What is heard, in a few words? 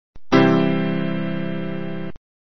major triad